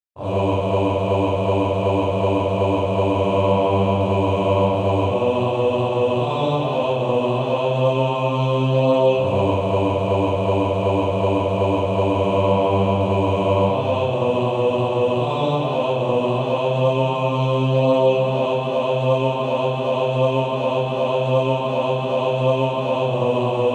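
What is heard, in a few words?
Male Choir, bass; Redeemed How I Love To Proclaim It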